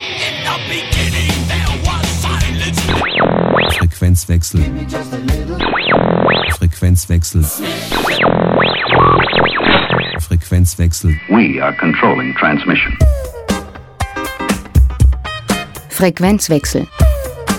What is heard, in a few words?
jingle